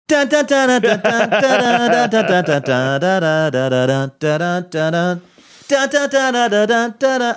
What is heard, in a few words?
singing portion